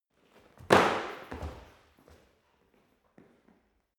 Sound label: impact hard indoors